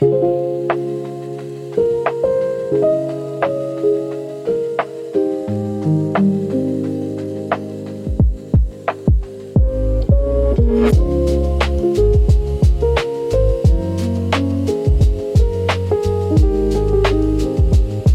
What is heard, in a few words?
Rain on Old Keys, lofi piano for recall and nostalgia